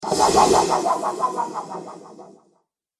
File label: Ufo Strange Launch; Spacecraft Sound Effects